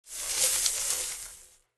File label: Sand Crumble